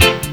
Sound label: RAGGA HIT, Ragga & Dub Reggae; USB Soundscan vol.27